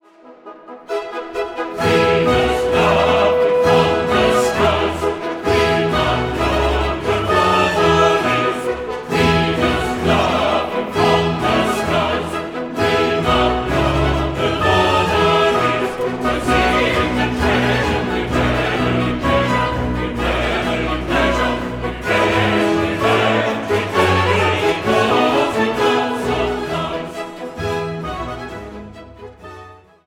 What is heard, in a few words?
Chorus; Emelyanychev; Handel; Theodora HWV 68; Pt. 2 Scene 1; Venus Laughing from the Skies